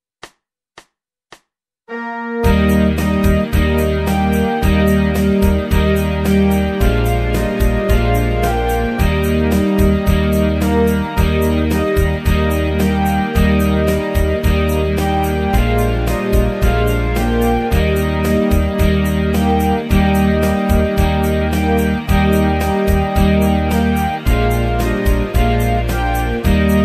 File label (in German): Le Coucou Französisches Volkslied; Schulband Musizierklassen; violine 1 2